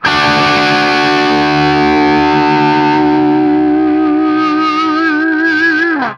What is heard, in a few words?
triad D, guitar; East West; Steve Stevens Guitar Samples Collection